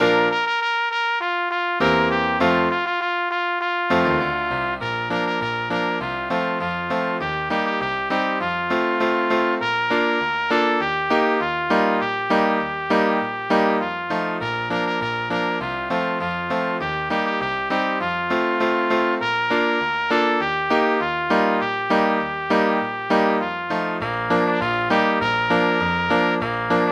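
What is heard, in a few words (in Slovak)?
pre trúbku a klavír; Cesta domov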